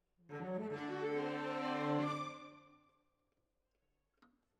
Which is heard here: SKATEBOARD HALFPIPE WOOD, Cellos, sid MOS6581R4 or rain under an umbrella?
Cellos